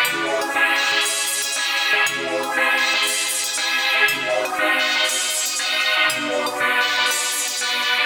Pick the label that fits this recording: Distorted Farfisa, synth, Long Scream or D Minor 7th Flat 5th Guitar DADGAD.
synth